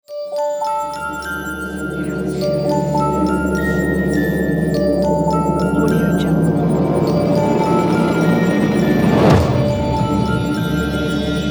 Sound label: horror logo